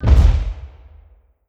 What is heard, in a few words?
bombardment explosion; Stellaris